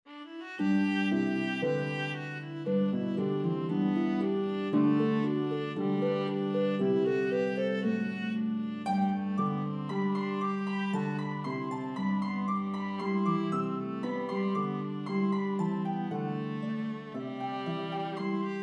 Carolans Concerto, Duet for Viola & Harp

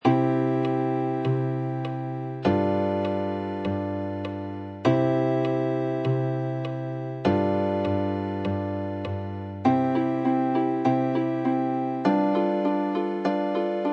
soaring birds, Folk Harp

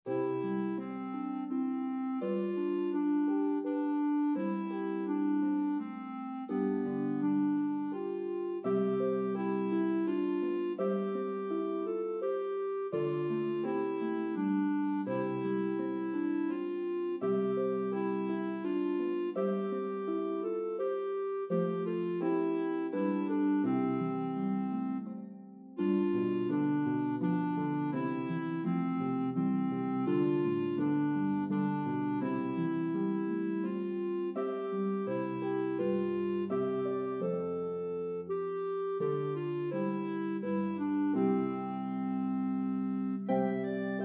Clarinet Harp; Infant So Gentle; duet for bb clarinet harp

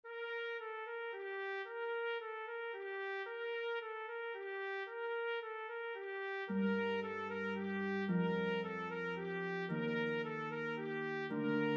Bb Trumpet Pedal Harp; Carol of the Bells; duet for Bb Trumpet & Pedal Harp

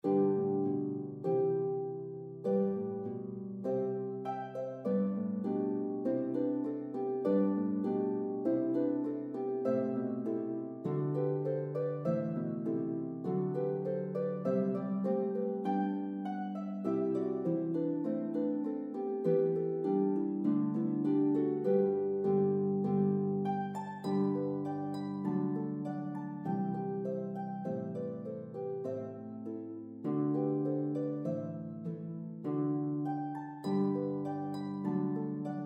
Harp Duet; George Brabazon

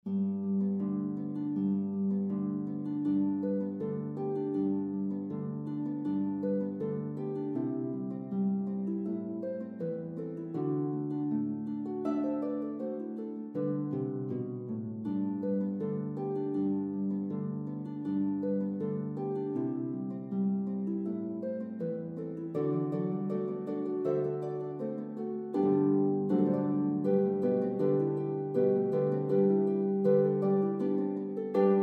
Jingle Bells, Harp Duet, In the key of G

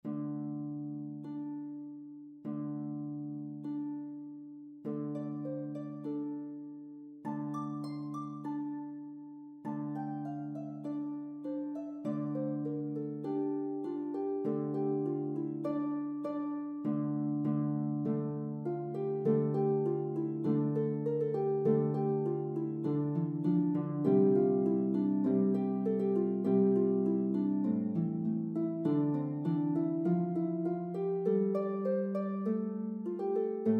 Folk Harp; The Call of the Loons was inspired by the beauty of the different vocalizations of loons, known as divers in Scotland.

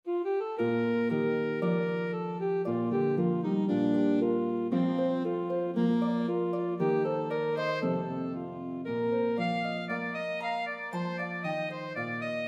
Eb Alto Sax Harp; Carolans Concerto; duet for Eb Alto Saxophone & Harp

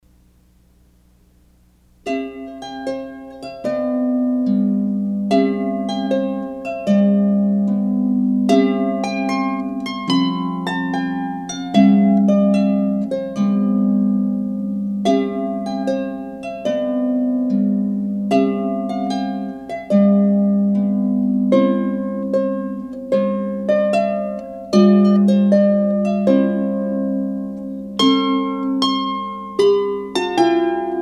barge, harp

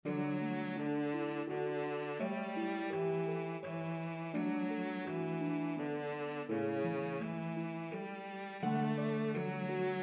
Cello Harp, French Lullaby; Duet for Cello & Harp; This French Lullaby is perfect for setting a calm, meditative ambiance.; Infant So Gentle

